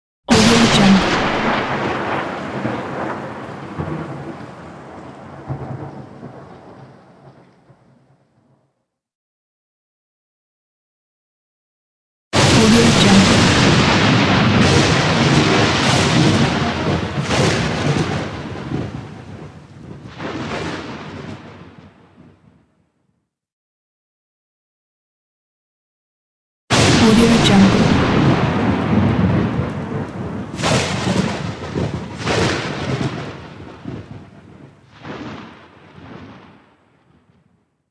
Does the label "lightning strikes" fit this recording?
Yes